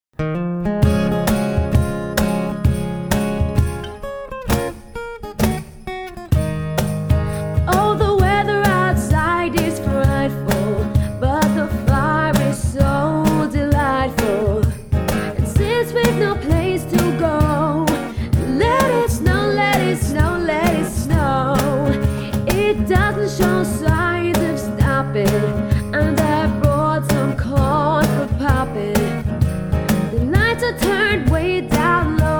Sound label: Acoustic Rock Pop Band; Surrey